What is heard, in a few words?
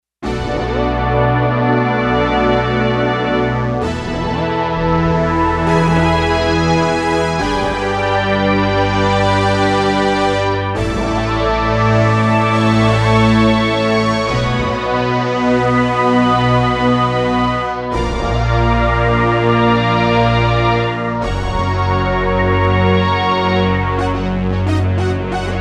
Double Stack, synth